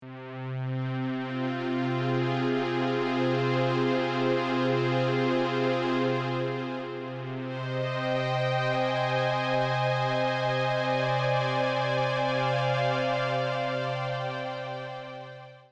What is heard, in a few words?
BLUE SYNTH; Creamware Pulsar 2